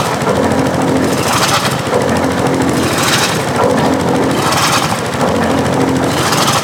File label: Ocean Pump Machine Loop; Ambience; Props; Warframe; Grineer Ocean